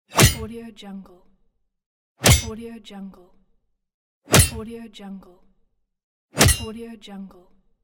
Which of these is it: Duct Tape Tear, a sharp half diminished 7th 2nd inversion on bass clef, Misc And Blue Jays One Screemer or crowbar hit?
crowbar hit